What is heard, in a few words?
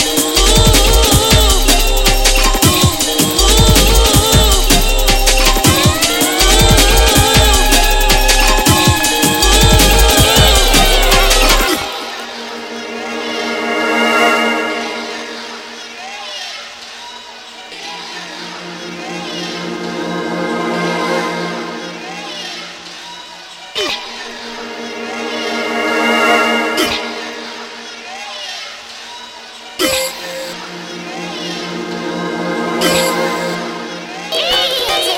Bells Whistles